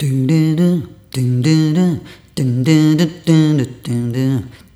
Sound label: Rhythmic C